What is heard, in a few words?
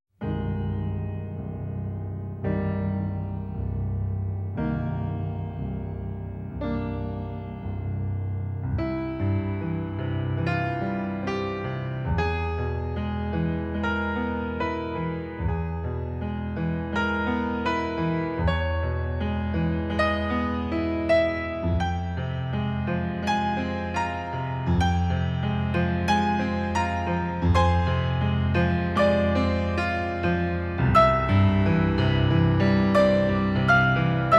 piano